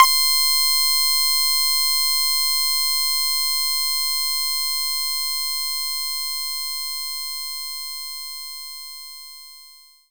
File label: Stacked Saw